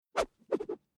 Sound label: Whoosh Swish Sweep By Long Bamboo Cane Swish Followed By Wobble